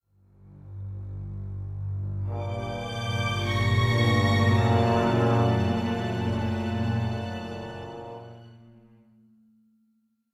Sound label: Stinger Despair; Costume Quest 2